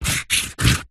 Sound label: office marker pen highlighter luminous draw triangle on cardboard